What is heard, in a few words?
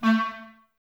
clarinets, Wood Winds